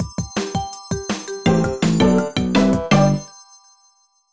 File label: Adoption Success; Moshi Monsters Moshlings Theme Park; NDS